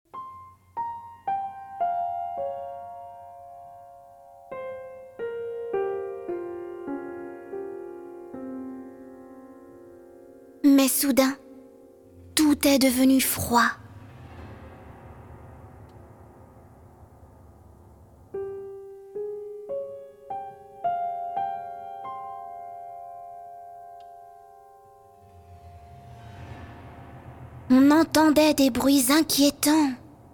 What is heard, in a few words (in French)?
au clair de la lune instr piano